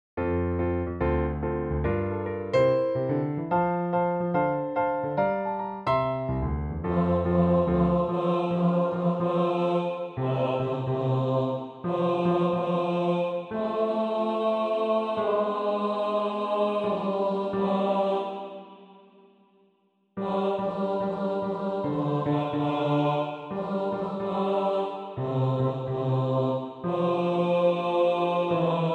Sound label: BASS